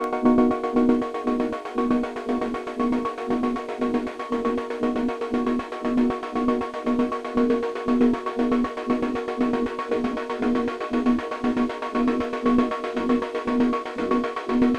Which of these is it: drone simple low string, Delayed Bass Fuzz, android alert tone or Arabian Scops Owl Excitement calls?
Delayed Bass Fuzz